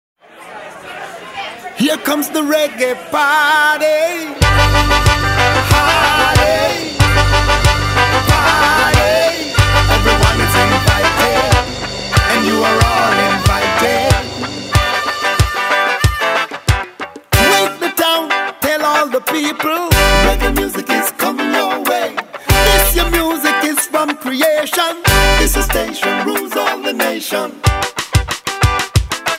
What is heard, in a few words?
Reggae Party